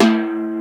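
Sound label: Timpani Drum A1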